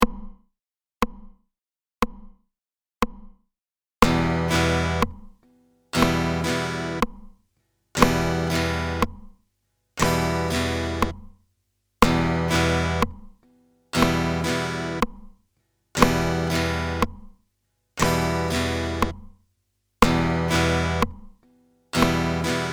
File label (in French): Couper le son